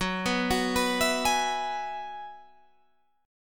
Gb 7th Suspended 2nd Suspended 4th, Guitar, Standard; arpeggiated; capo 9